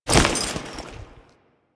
Foley Step; Warframe; Kahl

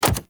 Step Default, Foley Footsteps, Pets; Warframe; Zanuka; Zanuka Companion A

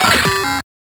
Hack Minigame Error; Entrati

